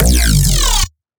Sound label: Bass Growl, Dubstep; Bass One Shots; Ghosthack; Dubstep Sound Arsenal; 50 Bass One Shots